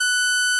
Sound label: Inharmonicity F#6